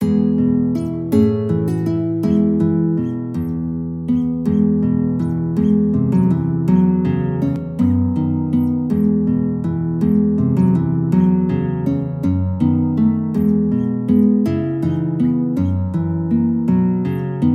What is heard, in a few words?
guitar